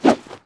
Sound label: throw